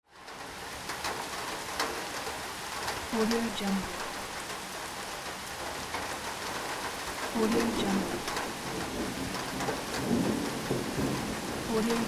rain and thunder

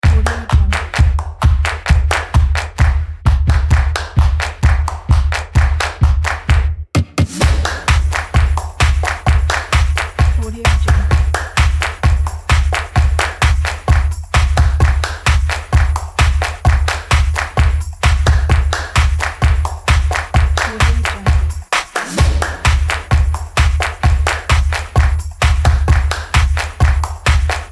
stomps

